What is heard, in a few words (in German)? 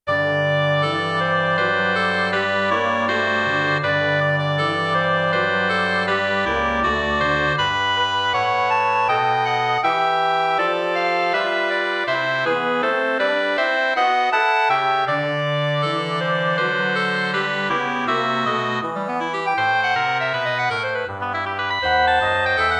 Quintett; Kahn